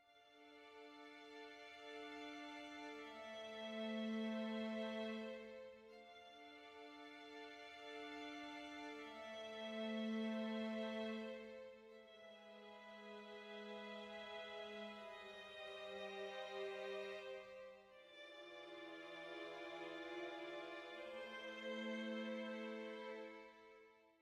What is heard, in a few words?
sad soft; Quivr; PS4 Windows gamerip 2016